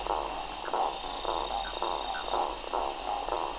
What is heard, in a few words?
swamp; bigcastle